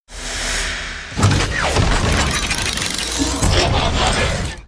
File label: Transforming Sound